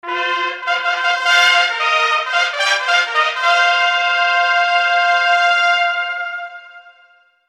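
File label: sounds of fanfares and solemn award ceremony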